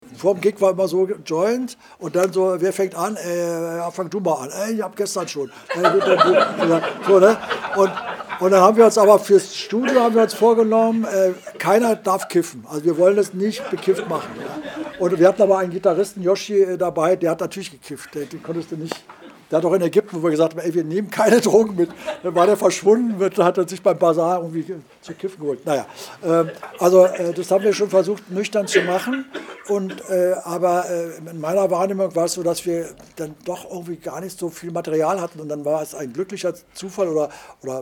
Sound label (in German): Orgel